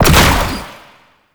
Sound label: Ranged Weapon Fire; Warframe; Necramech; Weapons